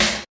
house Snare; House Kit; STYLE KITS (Hip Hop, Etc); Choosy House Kit

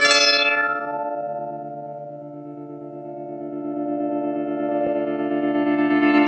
pluck wave guitar loop, Synth

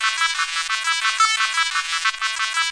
176 buzzy-sh-synth, synth and electronic loops

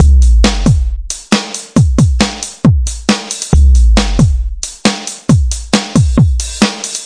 136 slick, drum loops and breaks

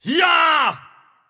viking he yahhhh, Voice; single hits

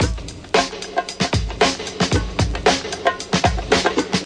113 original, drum loops and breaks

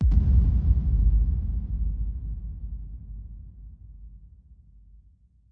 giant reverb kick; Kicks; single hits

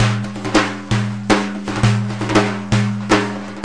132 mideast squishy snare, drum loops and breaks

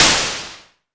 mbrute noiz, Arturia MiniBrute

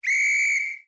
sports whistle; Cartoon FX

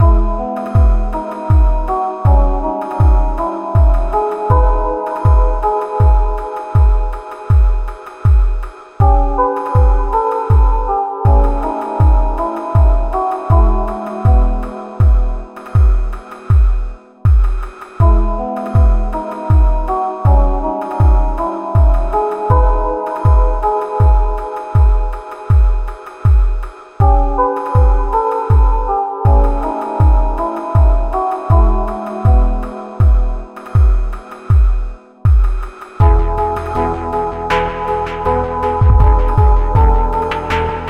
placid

